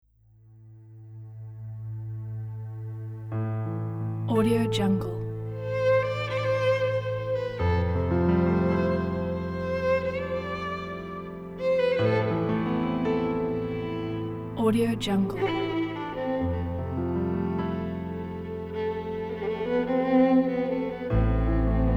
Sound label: carol of the bells drama violin